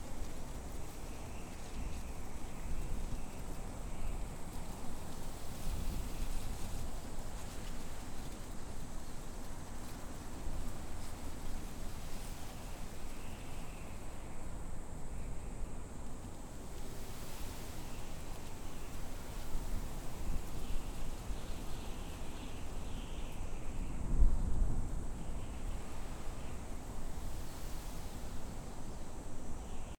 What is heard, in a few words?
outdoor ranch town